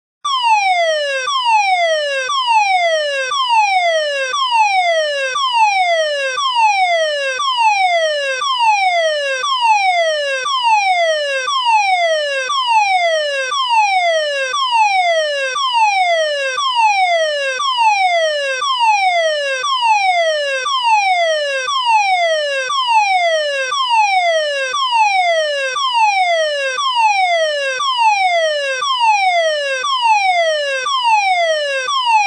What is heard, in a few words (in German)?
Feueralarm nach DIN 33404